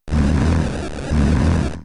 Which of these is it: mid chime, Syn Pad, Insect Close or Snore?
Snore